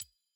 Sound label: sfx lobby suggested tab click; friend finder